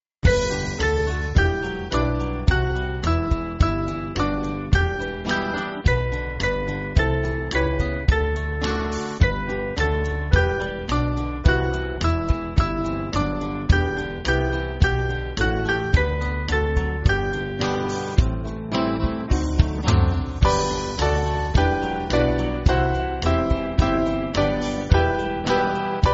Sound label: Band, church music